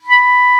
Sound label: Clar p B4; Digital Sound Factory Virtuoso